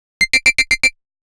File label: tone